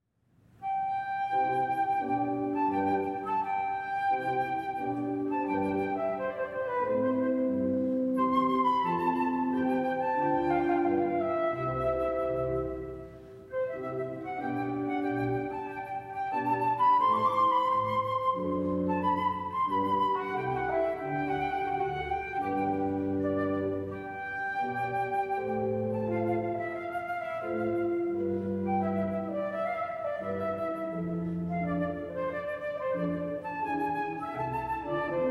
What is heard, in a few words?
Sonata in C for Flute; Marcello